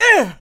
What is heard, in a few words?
Voices Male HurtGrunt